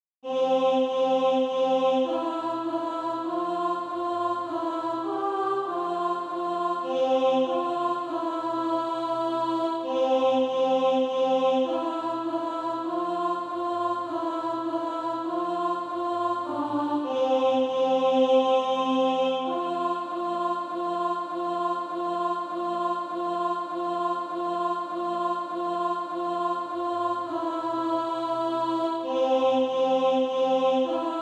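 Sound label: alto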